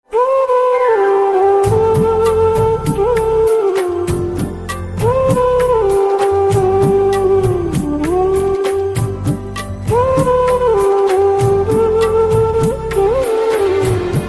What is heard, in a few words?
Flute